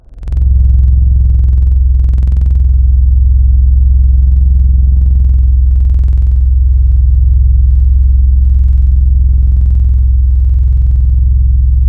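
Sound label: woodwind Oboe, Wavetables; Synthetic's Serum Collection; Standalone-Music SYMPHONY Wavetables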